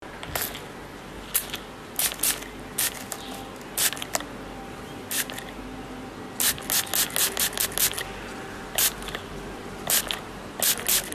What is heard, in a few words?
Perfume spray